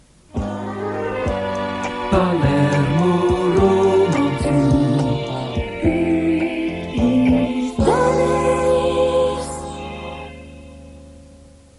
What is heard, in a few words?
jingle; Palermo